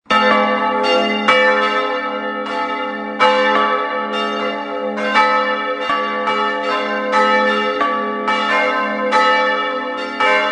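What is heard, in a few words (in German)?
Tonaufnahme a1 c2 d2 f2, mehrstimmige Geläute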